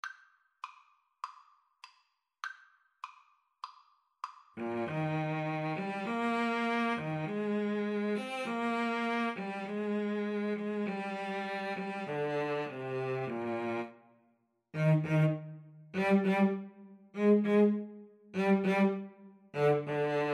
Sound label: trumpet cello, Trumpet-Cello Duet